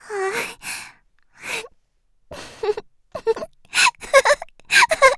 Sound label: laugh; Eternal Return; Tia; Voice Line; KOR